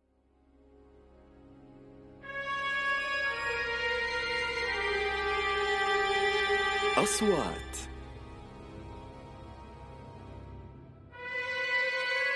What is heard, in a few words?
Pad